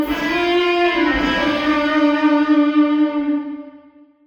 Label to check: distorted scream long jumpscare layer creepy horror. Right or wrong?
Wrong